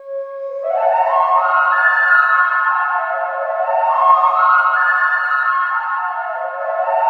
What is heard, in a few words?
ENIGMATIC; Spectrasonic Distorted Reality 1